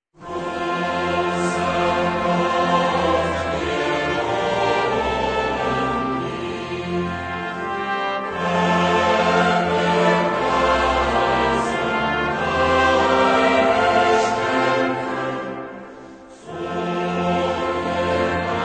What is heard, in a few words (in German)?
Grosser Gott, Pfarrchor